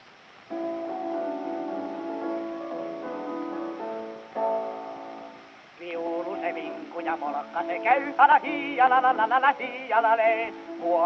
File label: Gramophone